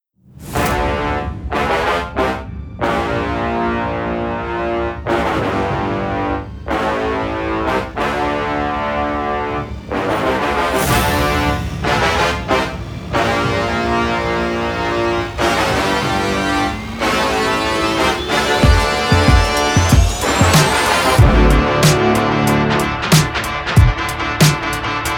Blast Off Pre Title Mix, Inner City, hip hop; Narrative Mind

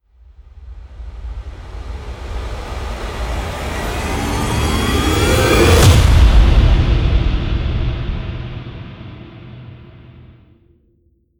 Impact, trailer tools, alarming; Narrative Mind

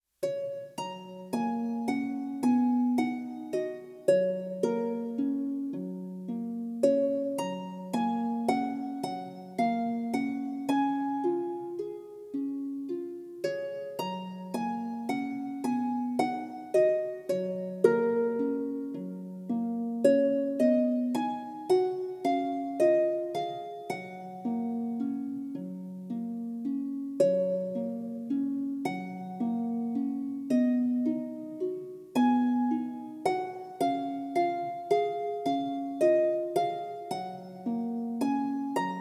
Harp Version; My Bonnie Is Over The Ocean; Tiny Tunes; Narrative Mind

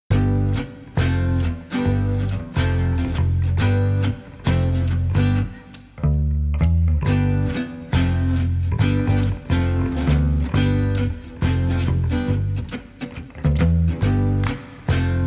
Gipsy Underscore, rock n roll surf rock; Narrative Mind

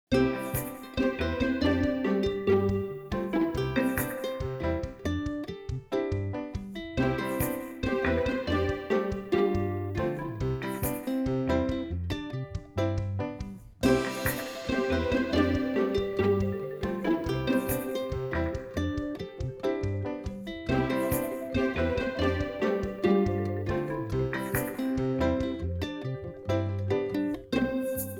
quirky underscore; plucked; Narrative Mind

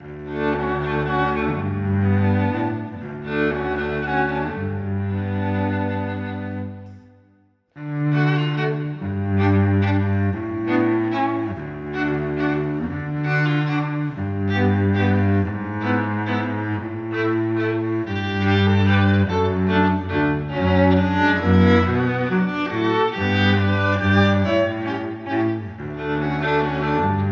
Muddlecombe Manor Underscore, Classical Cinematic; Narrative Mind

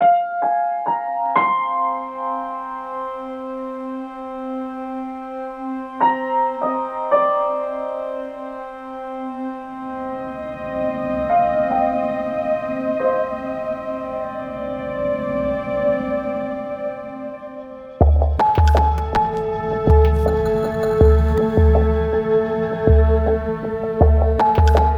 A Swirling Web, In Suspense

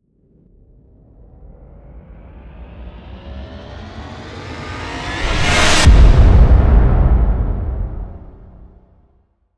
Impact, trailer tools, alarming; Narrative Mind

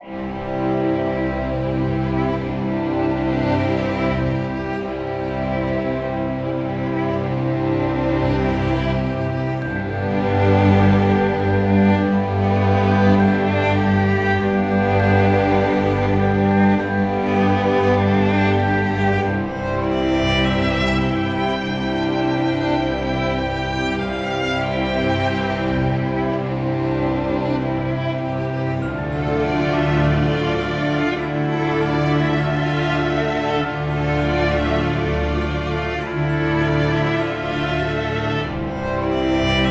Downstream Underscore, Autumn Strings

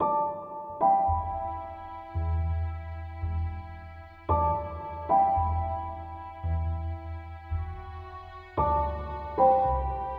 In A Heartbeat Underscore, Reflection; Narrative Mind

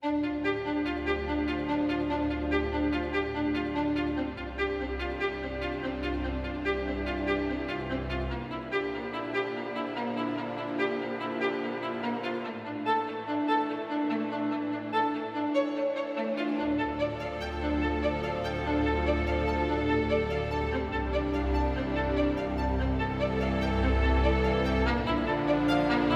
Whispering Leaves Underscore, Autumn Strings; Narrative Mind

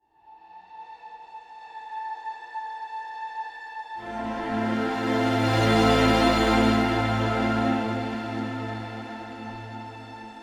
Breaking Waves, Cinematic Textures

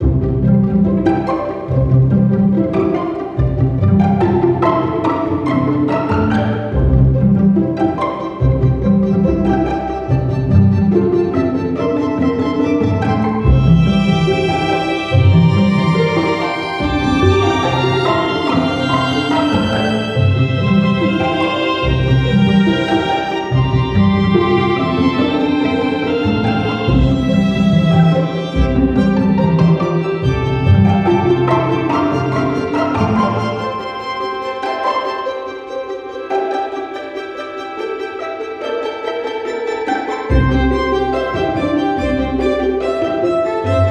Careless Underscore, Classical Quirky

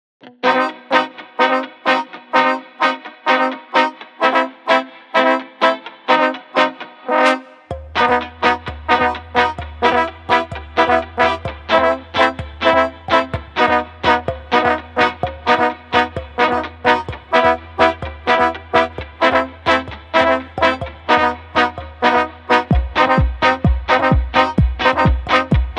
Underscore, Balkan Brass

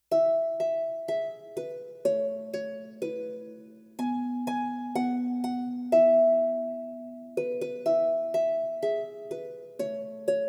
Harp Version; Old McDonald; Tiny Tunes; Narrative Mind

